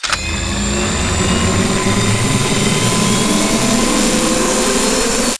arc cannon chargeup; Titanfall 2

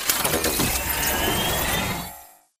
Reboot Power Up; AI Stalker; Titanfall 2

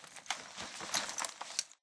wall slide; Titanfall 2

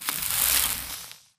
imp bullet energy default sizzle; Titanfall 2

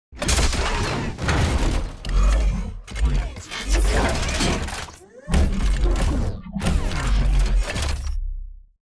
control room disembark; Beacon; BT; Titanfall 2

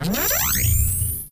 Sentry Turret Power Up; Boost Card; Titanfall 2

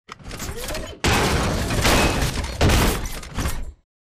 bt melee charge stomps forward; Titanfall 2

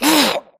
grunt death neck; Titanfall 2

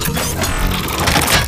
Servo Jog; Titanfall 2

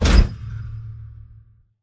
sliding door ext verb close stop; Titanfall 2

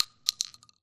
12 gauge shotgun shell drop; Titanfall 2

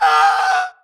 grunt death crush; Titanfall 2

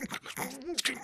spectre chest punch idle grunt; Titanfall 2

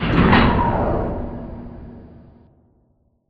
Robot Arm Track Stop Mid; Boomtown; Titanfall 2

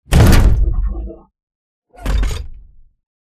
embark casual above left; Titanfall 2

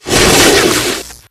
hardpoint electricity; Titanfall 2

